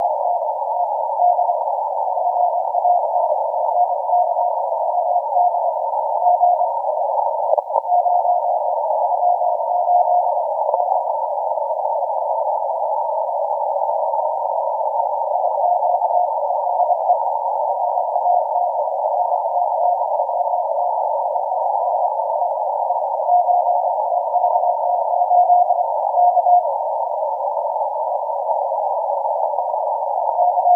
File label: 30m